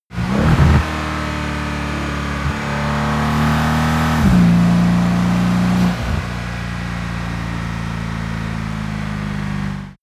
car mercedes amg c63 s short acceleration deceleration